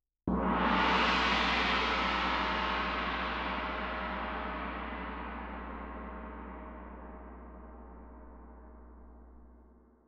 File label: Tam-Tam Main